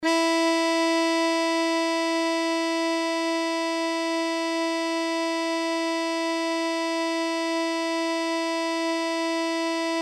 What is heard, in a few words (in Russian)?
3 struna, struni gitari